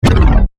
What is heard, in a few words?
Laser Drop; Lasers